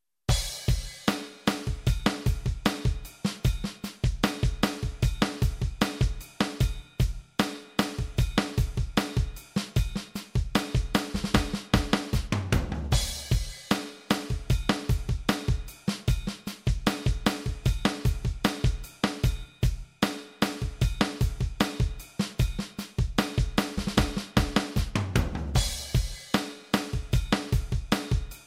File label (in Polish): oni zaraz przyjda tu perkusja